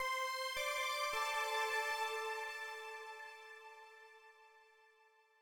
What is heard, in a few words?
Tension Stinger